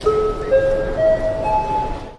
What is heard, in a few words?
Announcement Tones